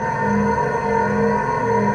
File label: Saint; urgSaint; Seer; GamigoNA